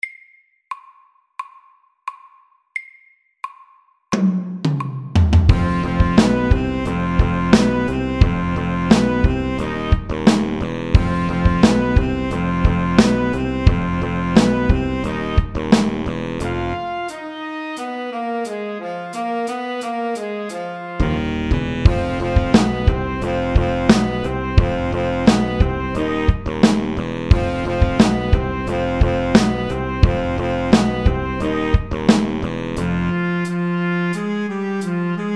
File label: Have some funk MEDIUM 88